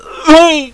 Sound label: die; svencoop; paranoia; diversant